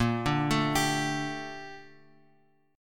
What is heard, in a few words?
Bb Major 13th Bb Bass Standard; arpeggiated; JGuitar